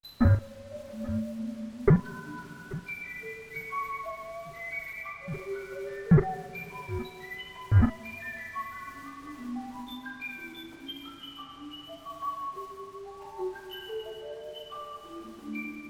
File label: New Message Chime